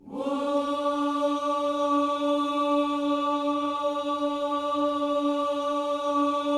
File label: WHOO D 4A, Vocal Planet Gospel; ILIO